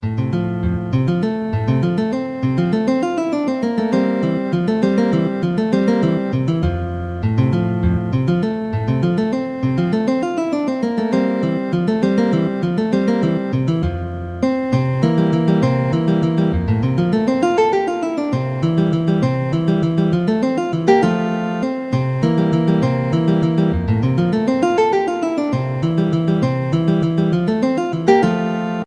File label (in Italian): valses, guitar